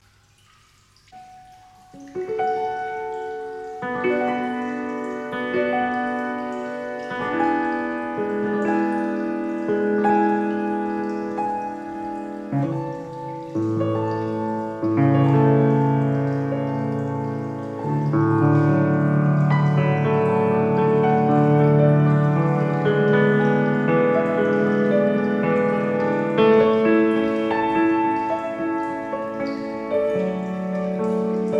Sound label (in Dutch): piano improvisatie